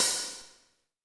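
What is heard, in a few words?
hi-hat, Real Mega Drums; Best Service; Real Mega Drums VOL-1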